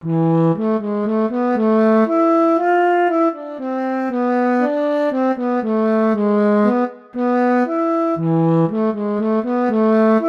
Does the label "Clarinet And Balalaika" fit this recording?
Yes